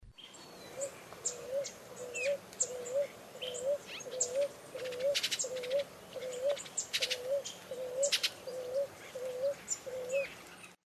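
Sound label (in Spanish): torcaza collar, aves; cantos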